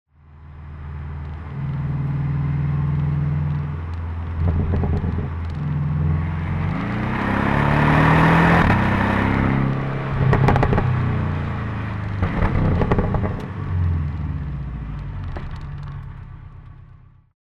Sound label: exhaust 718 driving; TECHART